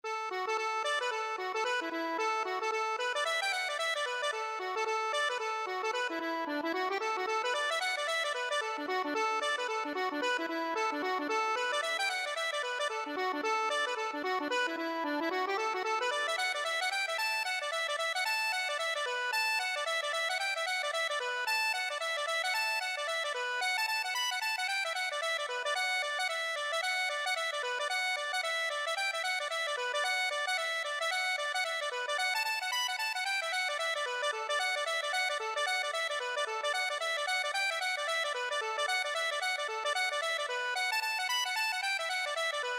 accordion

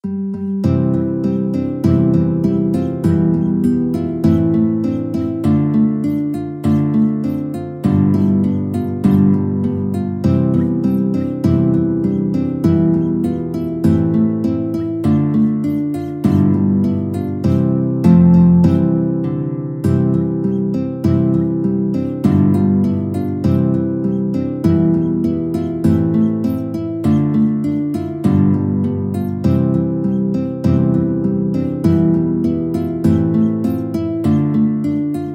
guitar ensemble

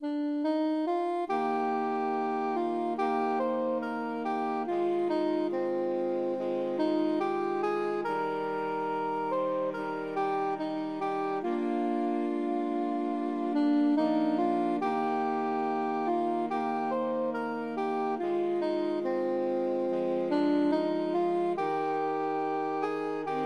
danny boy SAX

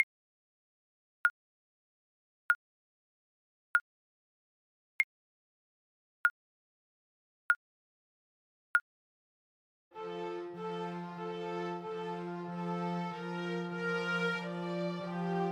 bach sheep fl kar, Flute

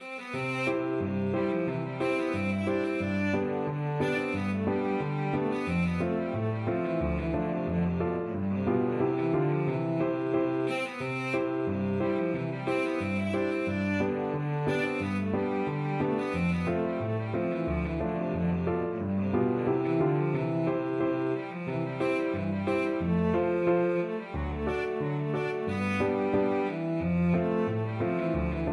sailors hornpipe, cello

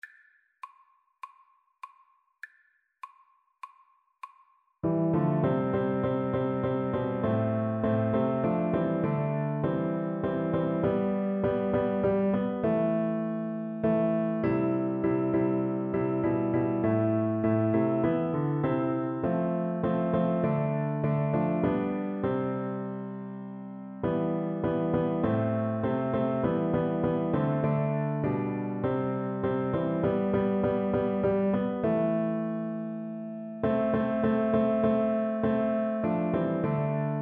jana gana mana FL kar, Flute

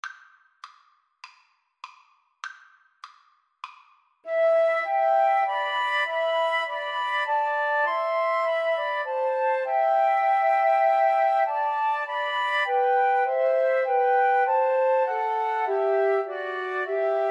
emmanuel, Flute Trio

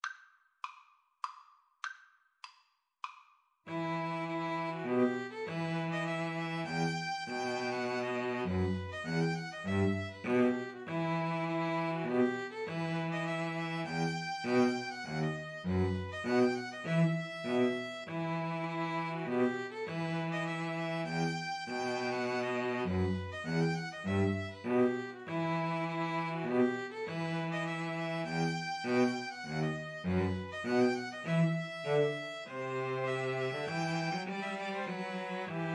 Polska, 2 Violins Cello; Starkens

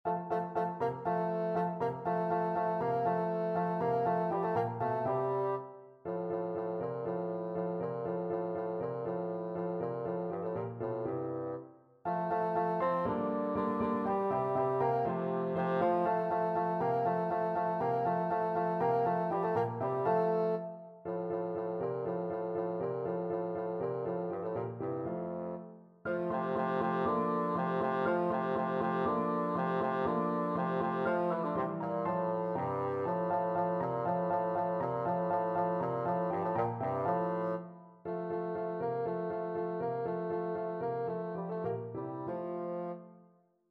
vivaldi autumn 1st mvt, bassoon

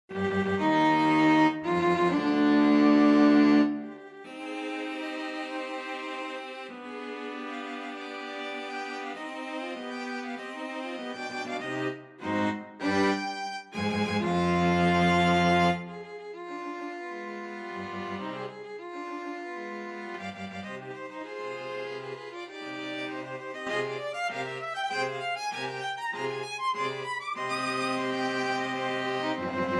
symphony, string quartet; beethoven symphony5 1stmvt

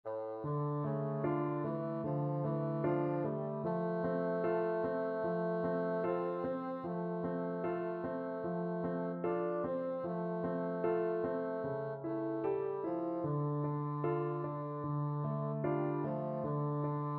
bassoon

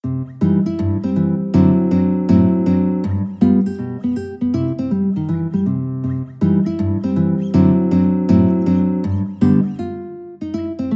rag, guitar

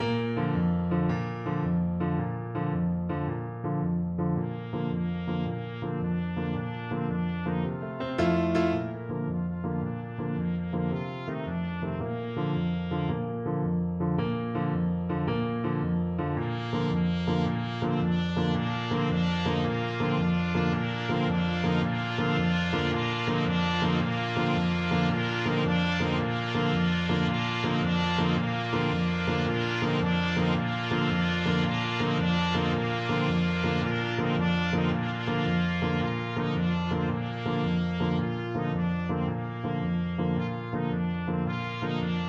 row row row 4TPT, trumpet quartet

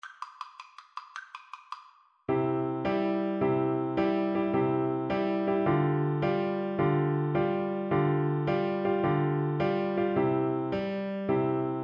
flute

